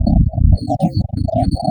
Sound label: TECHNO FX 140